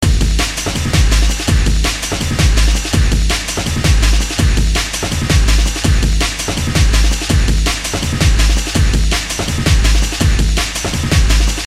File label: Loop